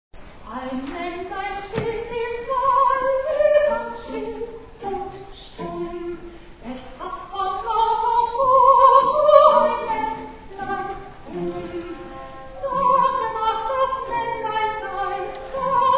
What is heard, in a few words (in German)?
musik